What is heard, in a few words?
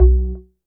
organ